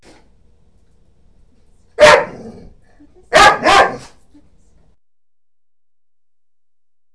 BARK

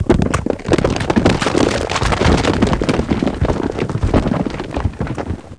rocks; Total Chaos Nukem 3d Pack; duke

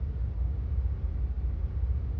sub loop; zones

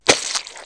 SPLAT

